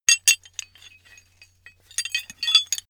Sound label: metal handling pieces sequence; atmosphaeren umwelt; baustelle